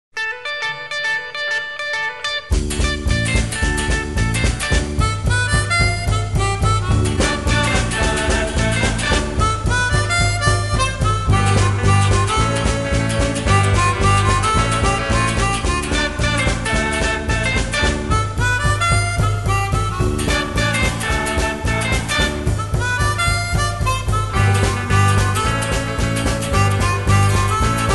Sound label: Bed Of Music; Pams